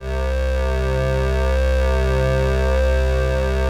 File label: LAMBDA, String Machines